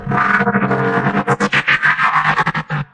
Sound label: transforming robot big machine; Transforming Robot Sounds